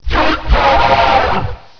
ghost; geist

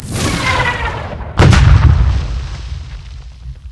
kablooie

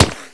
ric, bullets

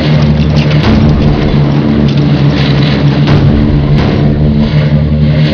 inside airplane, ambience

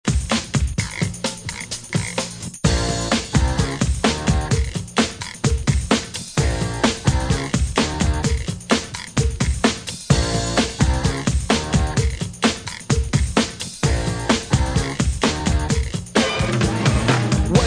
tone

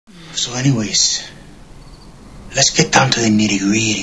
gritty

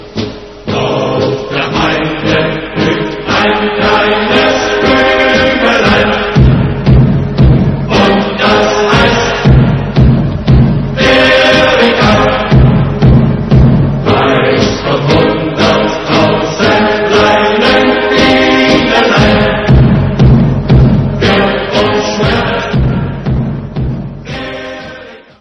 axis score

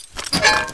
mortar; dod belfort

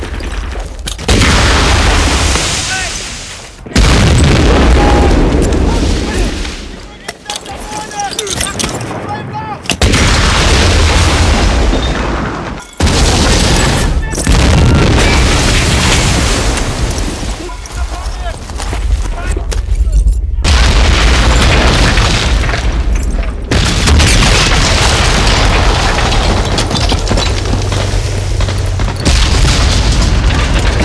ambience; dod

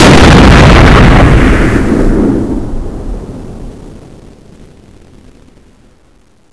tank fire

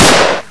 dog; gunz

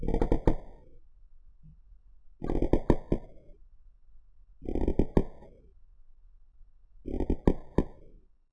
creak

